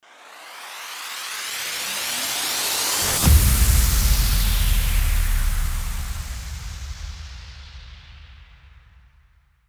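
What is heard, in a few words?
RISING IMPACT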